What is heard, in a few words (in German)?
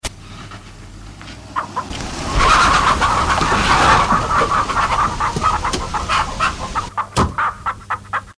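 Auto kommt Hühner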